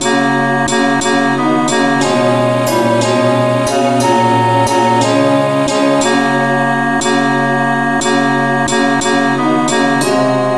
revnovocal key ges

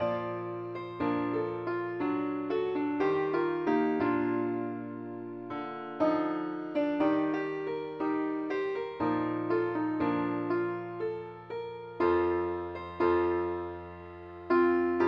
keyboard only

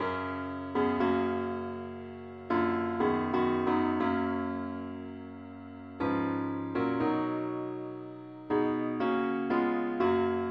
parts only key fis

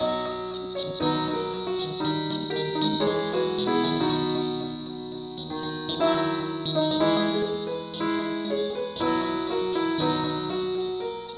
keyboard only key b

